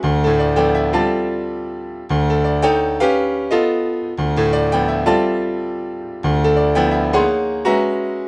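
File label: Latin Jazz Piano D major; Grand Piano; Digidesign; Pro Tools Essential Loops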